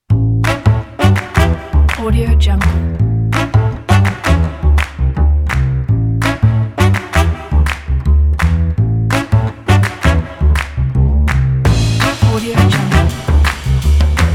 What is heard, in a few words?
fun quirky retro